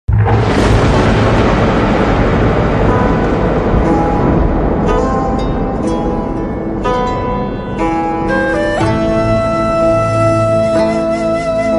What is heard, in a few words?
instrumental; kurulus osman; bamsi bey